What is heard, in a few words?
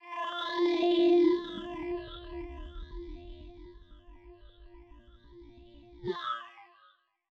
120 FX FM ambient F, Nu Jazz House; PowerFX